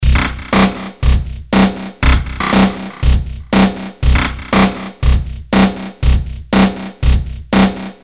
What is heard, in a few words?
rhythm loop Psico dealy